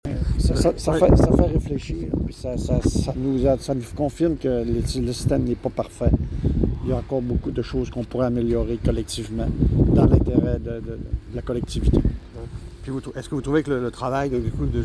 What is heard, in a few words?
Vocal